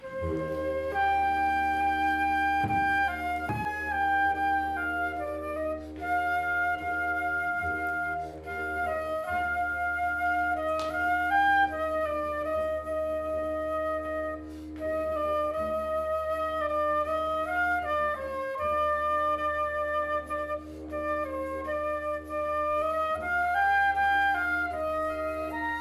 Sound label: Sleeping Tune; folkskovlund 2014